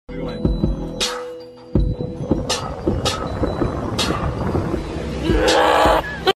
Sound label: Music Beat